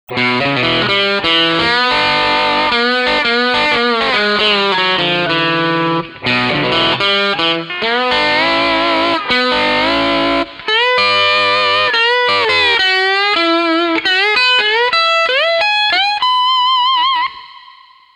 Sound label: Overdrive; Quilter Steelaire Pro